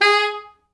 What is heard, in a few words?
G#3, Pop Brass; Best Service ProSamples vol.19; AKAI